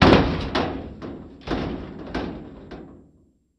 Garbage Can Impact

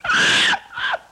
Macaw Scream Two Phra

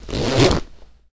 Denim Jean Zipper; zippers

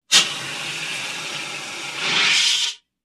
High Pitched Fire Ext, fire extinguisher

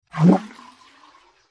Underwater Spear Bys, whoosh

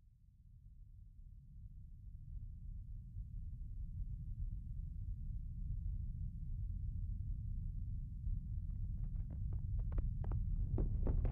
Avalanche 1, Many Large Rocks Falling with He

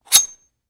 Knife Draws, knives

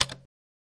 Computer Keypad, typing

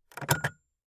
lighter pull

